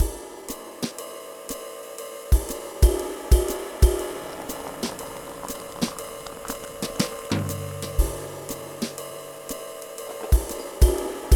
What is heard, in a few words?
Jazz